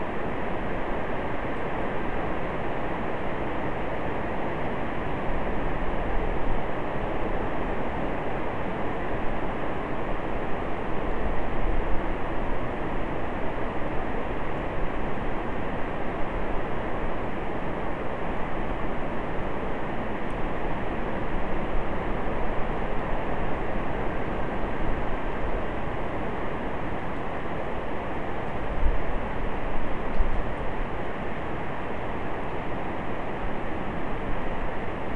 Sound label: no obvious biophony